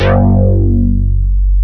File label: BASS C2; East West - Global Sounds